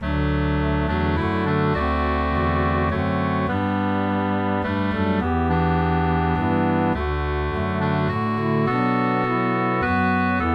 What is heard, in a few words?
Melody Bass Harmony; Mrs Jamiesons Favorite